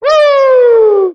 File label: "woo"